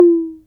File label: SYNCUSSION